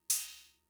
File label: Cymbal, Fricke MFB-522, Drum Machines